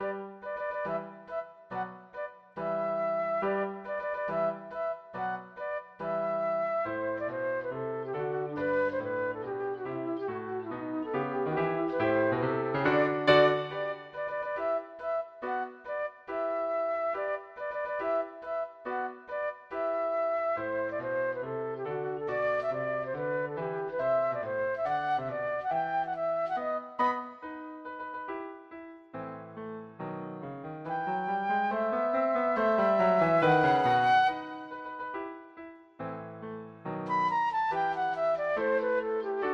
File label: tchaikovsky nutcracker, flute